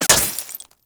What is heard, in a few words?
Laser Impact Glass Large; Warframe; Bullet Impacts